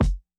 kick; funk

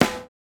snare, snare house

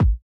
kick, house

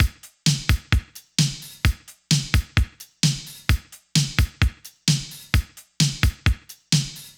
loop 130; Pop; pasific

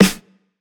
Hiphop snare

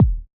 trap kick; Hiphop

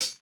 hihat, house

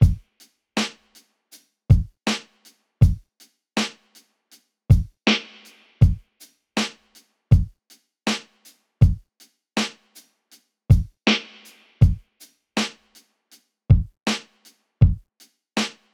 Jazz hop loop 80; Hiphop

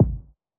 Hiphop kick; Reverb+EQ+Comp+Limit

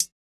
Electro hihat; Reference Beat

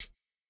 Jrock hihat

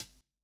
hihat, lofi hiphop; Legendary lofi hiphop

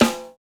snare samples, Rock

